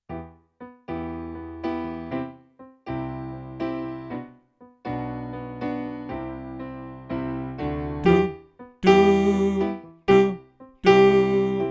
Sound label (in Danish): Sopran; oevefiler